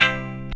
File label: GTR TON; E-Lab; X Static Goldmine 2; akai